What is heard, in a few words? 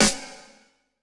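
snare drum, Drum & Bass, 160 BPM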